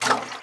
throwable slosh; breadmonster

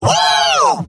scout positive vocalization; tf2; mvm

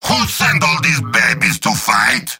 yell; heavy; mvm

